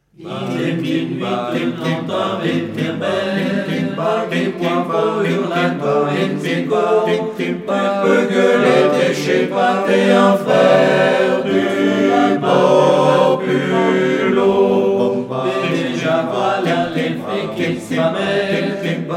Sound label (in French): valse